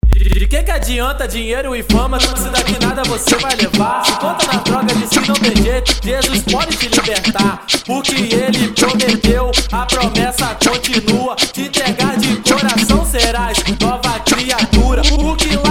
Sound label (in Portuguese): gospel funk funk gospel